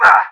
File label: pain; action-quake2